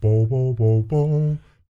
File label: Accapella, Doo Wopper, Blues & Soul